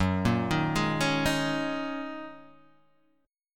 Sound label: Gb 6th Add 9th, Guitar, DADGAD